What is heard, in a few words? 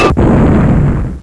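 player explode; Battle Zone; MacBzone 1.1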